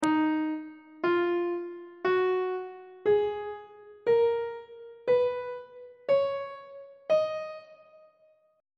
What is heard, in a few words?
e flat aeolian mode; on treble clef